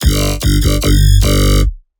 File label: Melodic Bass G 150, One-Shots Bass Hits; Ghosthack; Color Bass Essentials